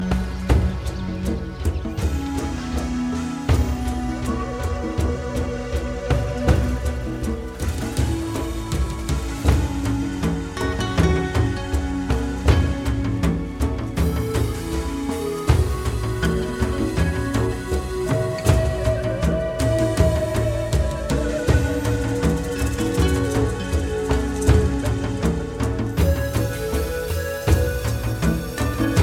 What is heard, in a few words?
African Drums